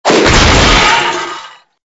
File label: cog fall apart; open toontown resources